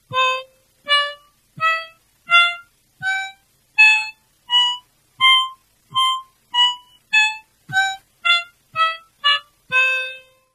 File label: gamma, garmoshki